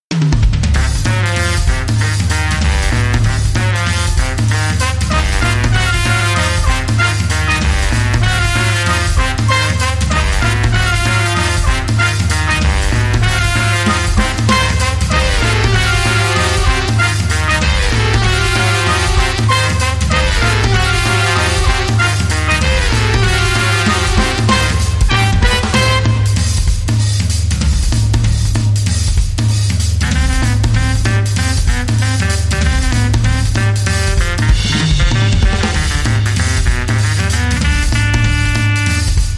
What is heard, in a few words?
Horns & Drums